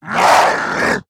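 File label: attack, zombie male; nmr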